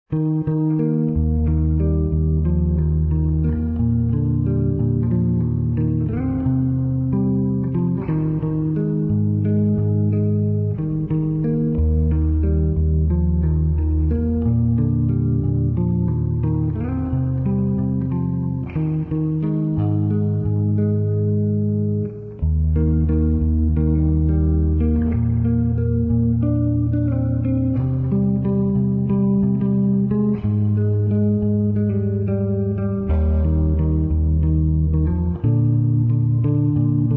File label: Guitar Electric Bass